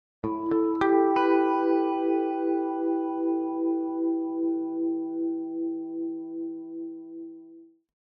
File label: Electric Guitar Harmonic Arpeggio With Flange; Hollywood Edge; sound designer's toolkit v2